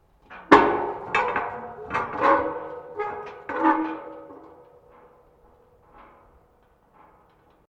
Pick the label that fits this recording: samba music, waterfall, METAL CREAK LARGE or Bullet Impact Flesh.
METAL CREAK LARGE